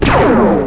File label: plasma; blobAndConquer; combat